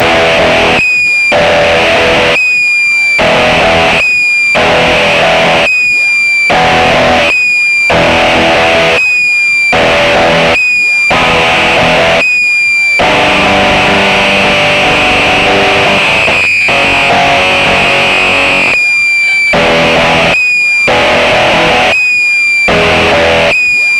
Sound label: osc scream; deathbyaudio